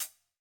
90s hiphop hihat; Reference beat bpm90-A

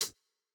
Jrock hihat

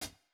hihat, lofi hiphop; Legendary lofi hiphop

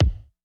kick; funk

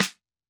hiphop snare, Effect on

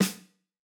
snare; Reference Beat BPM-130; Pophits

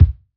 trap kick; Hiphop

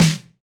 snare samples, Rock

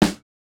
snare, snare house

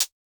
Electro hihat; Reference Beat

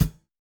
kick, house

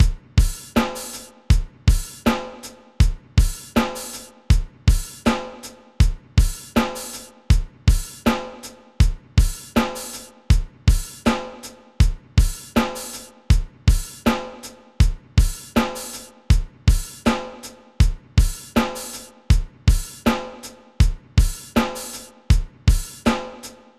hall resonance loop 80

